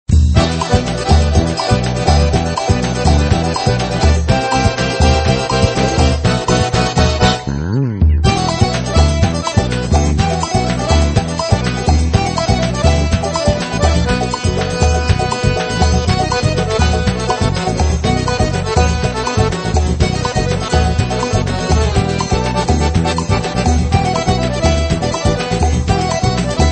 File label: Trio Forro